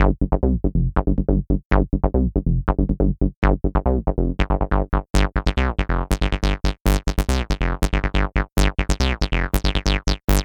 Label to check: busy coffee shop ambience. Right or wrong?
Wrong